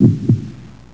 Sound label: heart